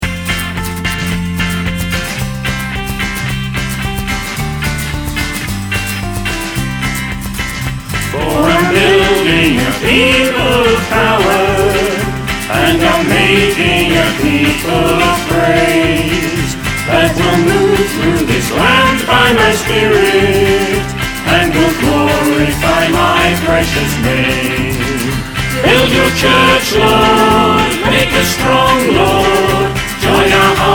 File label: choir